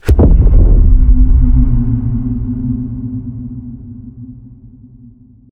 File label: mefistotel blowout, anomalies; Arrival